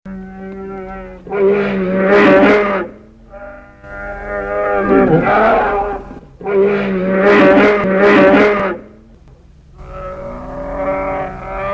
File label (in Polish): niedzwiedz